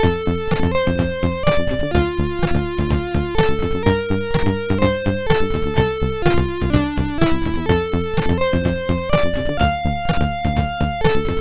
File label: Airbus, MOS6581R4